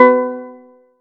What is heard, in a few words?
Chord; ModelCycles